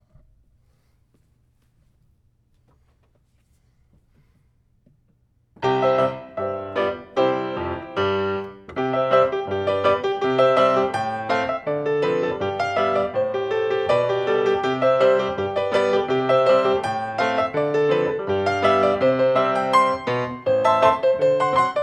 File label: C polka; Smetana